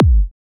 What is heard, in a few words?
Kick Electronic